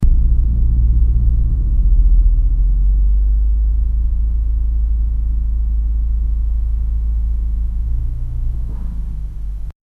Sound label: car accident sound